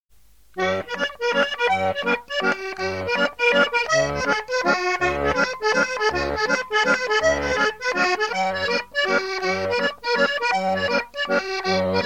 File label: mazurka